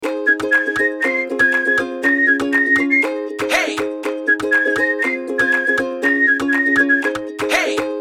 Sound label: Hey Whistle Ukulele Loop Ringtone